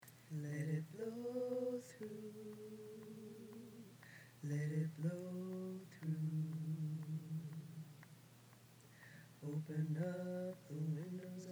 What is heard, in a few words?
ALTO